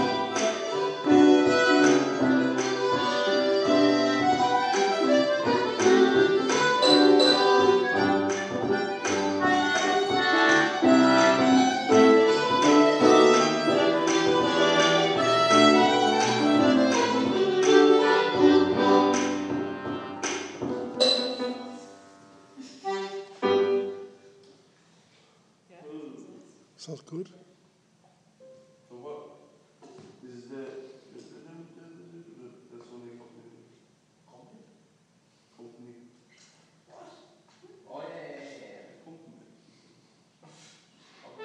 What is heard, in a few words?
soundcheck in the irish world academy